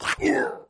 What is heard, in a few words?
death; jocly; tafl